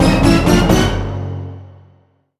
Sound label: Boss Fanfare